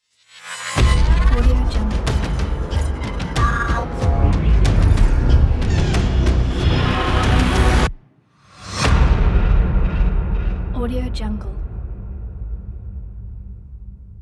hitech scifi logo; ghostwriters

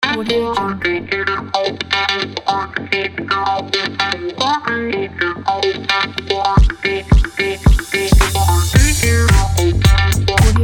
on funk it

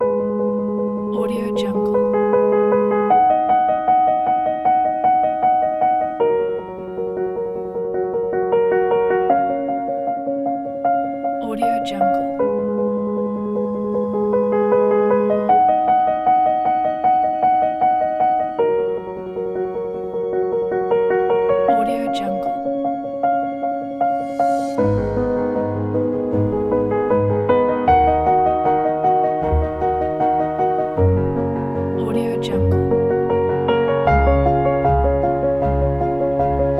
contemplative

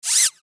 zip notification; sound ideas

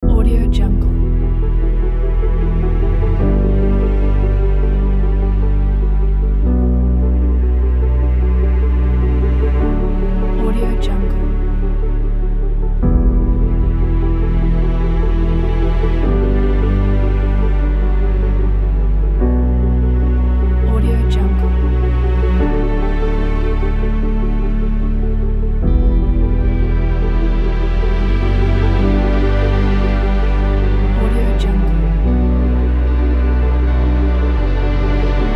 dramatic cinematic

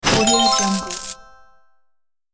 coins jackpot; sonic-boom

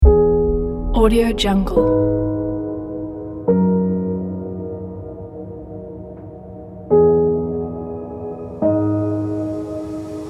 abstract soundscape inspiration

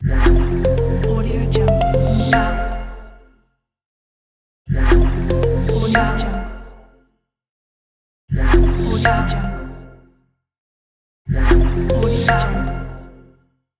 simple corporate business logo

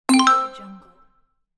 alert

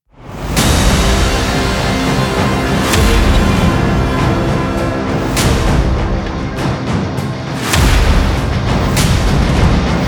rock trailer logo

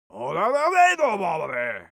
human vocal male shout angry

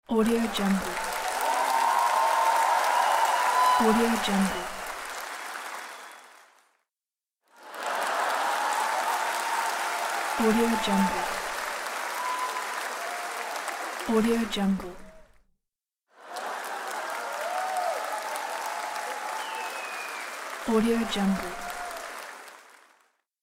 applauding

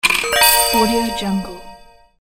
coin sfx

